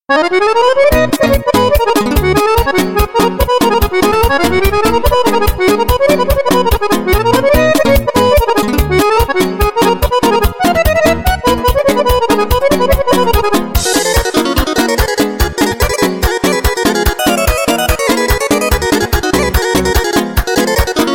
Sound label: Awesome instrumental